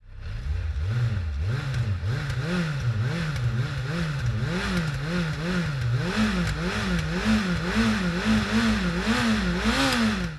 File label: yamaha xjr1300 gp1 serie; SEBRING